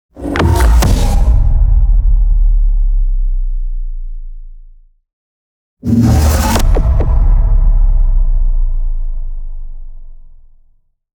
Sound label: Dark Drop